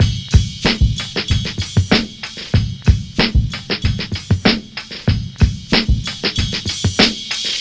hiphop

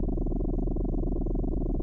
amb

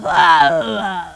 death; opentdm

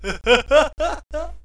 pick

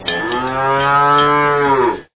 pain

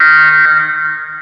peep

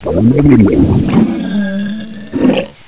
DROWN; opentdm

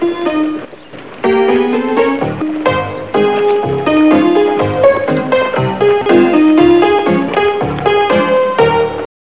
piano

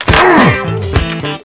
fall; velvetjackson

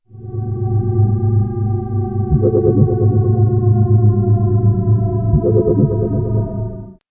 alien

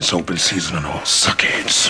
death; openffa; blade

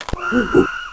fall

